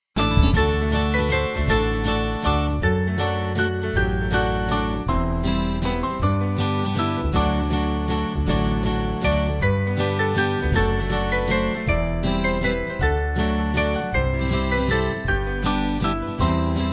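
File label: Traditional Band, Music Backing; My Eyes Be Opened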